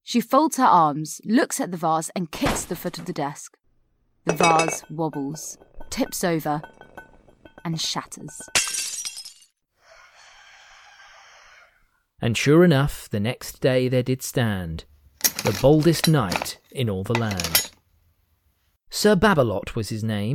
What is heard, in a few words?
Sound effects sample